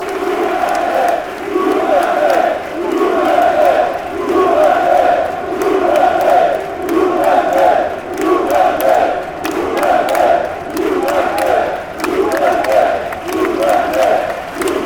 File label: chant, fanchants; athletic